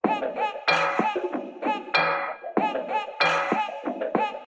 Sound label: Crybaby